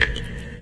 drip, weather; ambient; Source Engine; cstrike

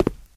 tile, player footsteps survivor walk

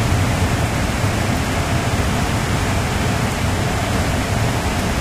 ac vent, ambient; Source Engine; tf; lair

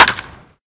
bat baseball hit, weapons; tf

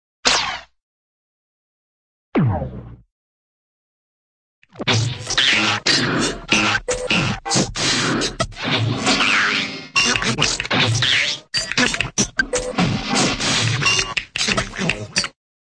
sci fi space pistol; cartoon

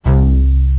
bass; roblox 2007

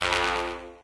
question, windows sound schemes; the 60's usa; windows 9x

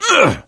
hurt minor, gambler, survivor voice; source engine; left 4 dead 2

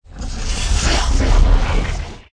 hell rumbles; Source Engine; tf

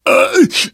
choke

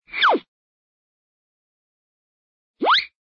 zip in, zip out; cartoon; hannah barbera cartoon sound fx

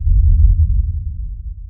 citadel cloud hit; ambient; city; source engine; episodic

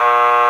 puck fail; Left 4 Dead 2; Source Engine

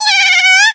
gremlin, halloween; Source Engine; tf

